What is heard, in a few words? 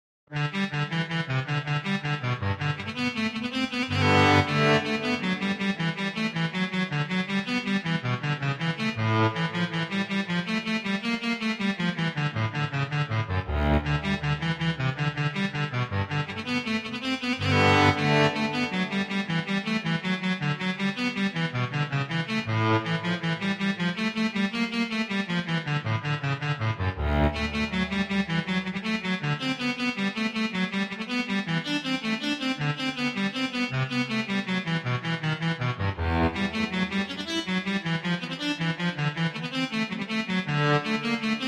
Gigue, Cello Suite No. 1 in G Major, bach synth; BWV1007